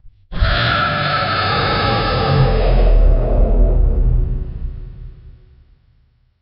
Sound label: power full clock human gi, giant